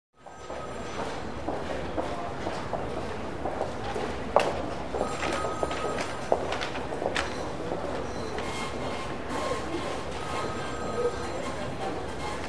Small Department Sto, stores

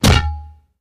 Mortar Blast, explosions

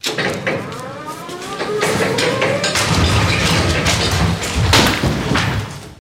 Forklift Elect Movem

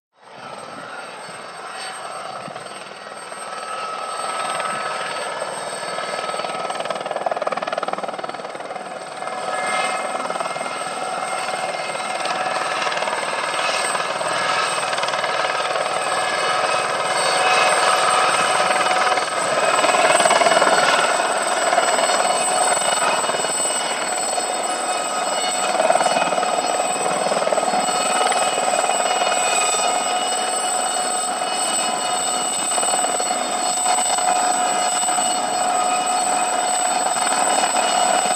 Gazelle Apprch By Hov, aerospatiale

